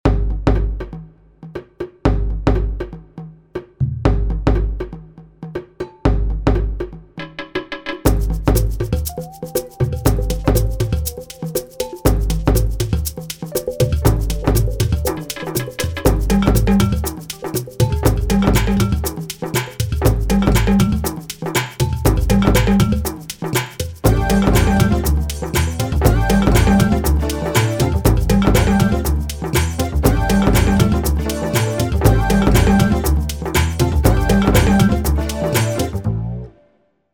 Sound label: Percussion